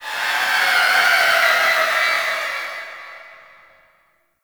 Exterior Creature; Ambience; Misc Creatures; Sentient Lair; Warframe

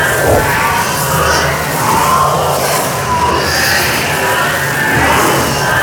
Wisp Reverie Beam Loop; Warframe

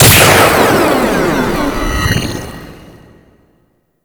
Ship Reactor Node Explode; Fomorian Ship; Space Battles

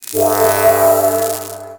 sign start; Ambience; Props; Warframe; TheNewWar; Zariman

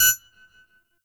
Minigame Timer; Corpus

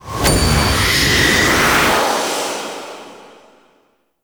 Raise Faith Cast; Warframe; The New War; Deacons

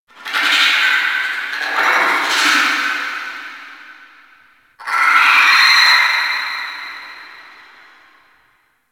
Ice Critter Distant; Warframe; Corpus Ice Planet; Ambience

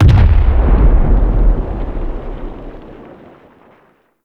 Far Step; Enemies; Steps; Warframe; Eidolon; Teralyst

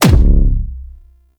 Concrete Archgun Punch; Warframe; Archwing

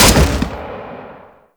Gun; Warframe; Jackal

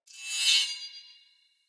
Throwing Knives Running Throw Wind Up Accent; Pothia

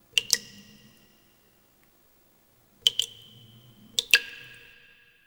Terrarium Drips; Warframe; Ambience; Entrati

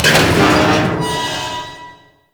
Ice Elevator Stutter; Warframe; Corpus

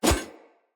Split Sword Whoosh; Weapons; Swords; Starter Split Sword

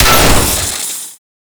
Hammer Boss Pipe Blow; Pipes

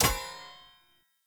Short Sword Medium Impact Metal

